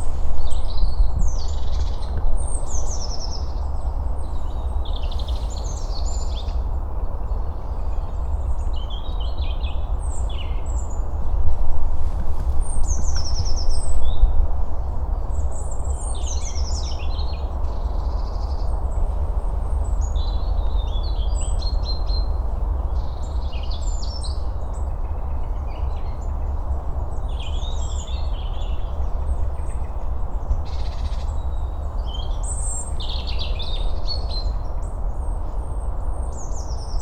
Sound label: Birdsong; Oxford Island; Lough Neagh; SoundScapes